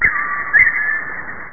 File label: Birds; amigamud